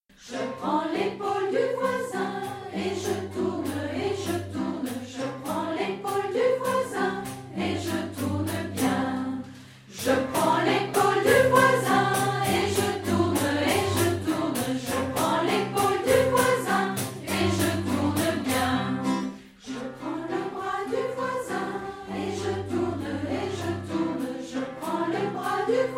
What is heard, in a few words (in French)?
Farandole